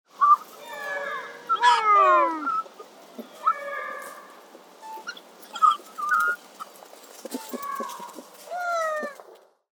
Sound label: Catta contact calls various, lemur; The Audible Phylogeny of Lemurs